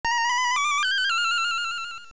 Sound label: Fairy, Encountering a fairy; Encountering a fairy or using the Raft (edited to remove clicks); AOL